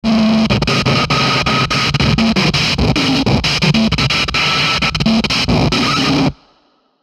Glitch Long Chopper Distortion Bursts, Glitch Harsh Blip Warp; Production Element Imaging Element Accent Transition; Blastwave